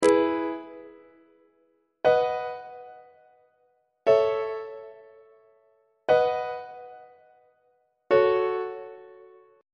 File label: e major deceptive cadence on treble clef